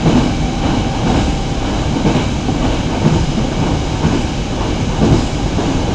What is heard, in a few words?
Run 2, train; nankai6000; OpenBVE